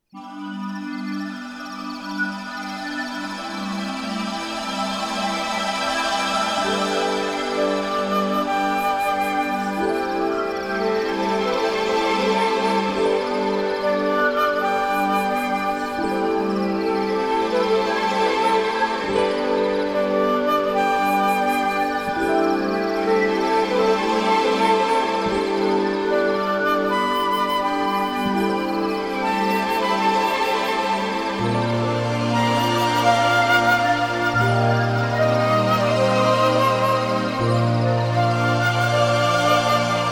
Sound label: Flute Melody; Vaporwave